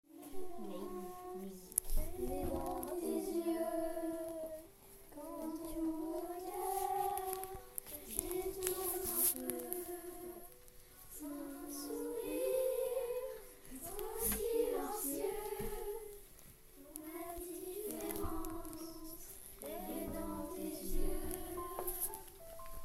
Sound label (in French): CHANTEE PAR LES ELEVES